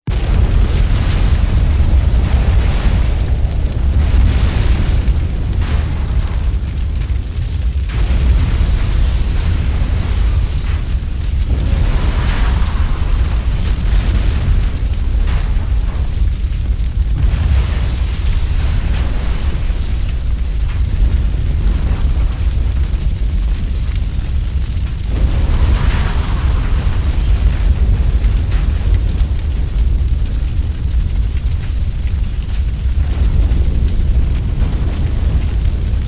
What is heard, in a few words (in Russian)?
pozhar vzryvy na pozhare